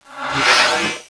door open

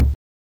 ele Drum

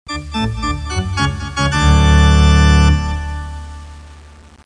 Carnival short; How Many Bugs in a Box

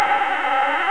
scaffold, lift; MONALISA

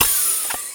door open

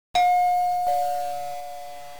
DOORBELL

